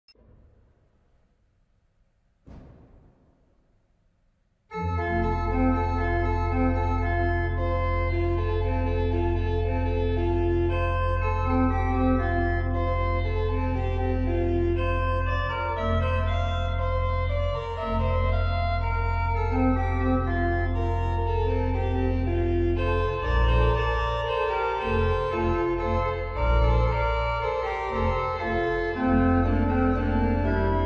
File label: pastorella for organ; Black Forest